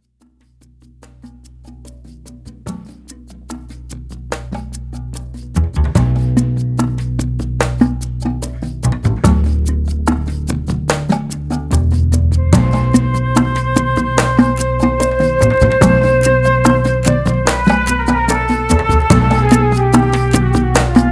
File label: folk music